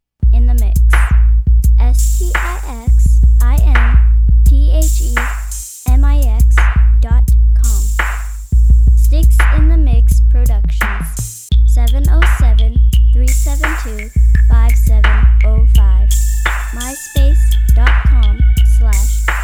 drop base

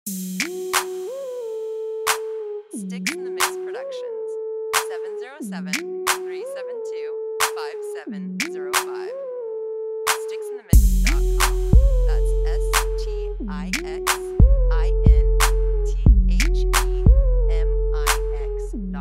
Whiner

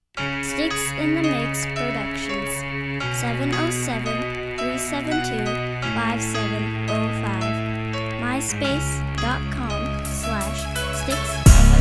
piano

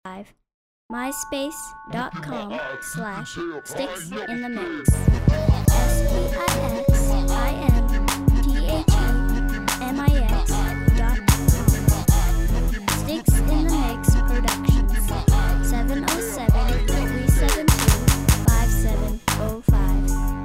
south brass

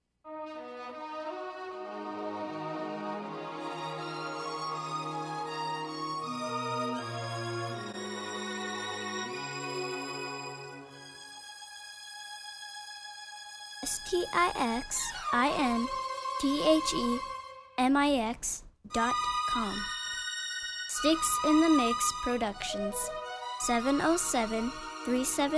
soul strings

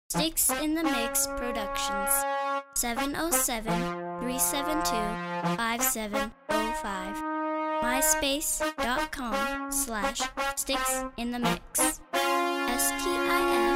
brass

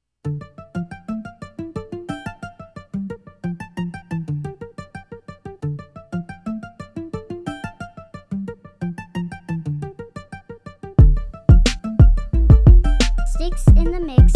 mute crunk

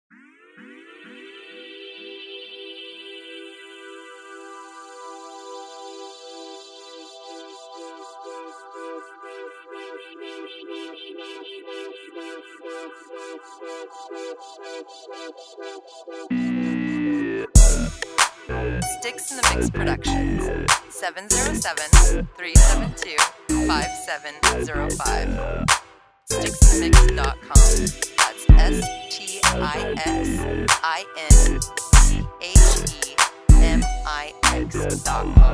Vocoder; Mob vocoder

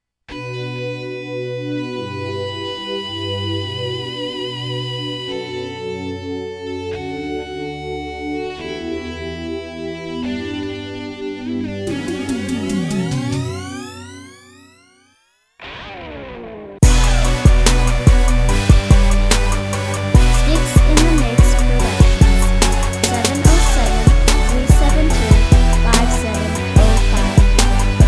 Metal south

